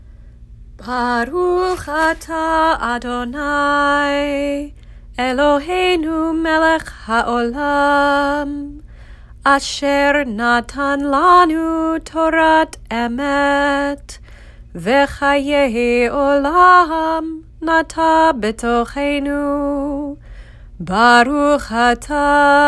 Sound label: blessing after torah reading